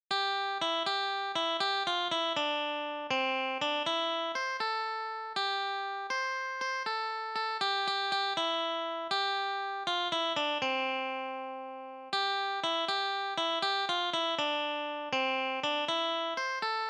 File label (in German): Gitarre; traditional